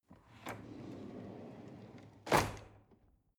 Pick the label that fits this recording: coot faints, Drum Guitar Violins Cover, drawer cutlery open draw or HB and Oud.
drawer cutlery open draw